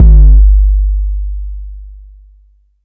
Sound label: Kick Boomin 808